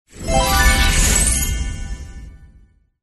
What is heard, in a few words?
win; Brazil; slotox